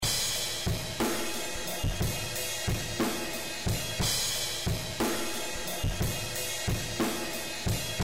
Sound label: drums